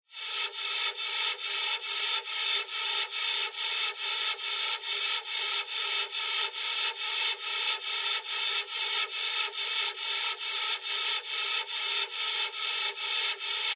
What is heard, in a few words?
Noise FX Loop (140 BPM); Enhance; revealed fx loops vol 2